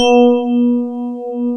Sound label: KBRD E5, ELEC.PNOS; East West; Global Sounds